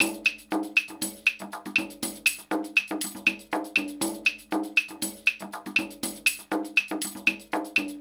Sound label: 120 beat perc, Nu Jazz House; 120 Beats; PowerFX